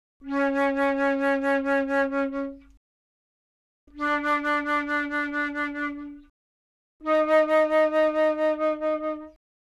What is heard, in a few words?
Flute; 気鳴楽器; AirReed